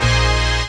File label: CHORDS; EastWest; Dance Mega Hip Hop Electro